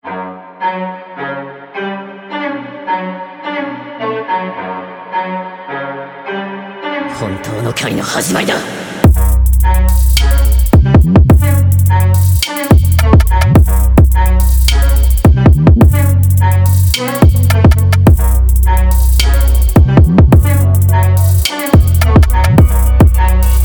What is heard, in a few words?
Speed Up Remix; Solo Leveling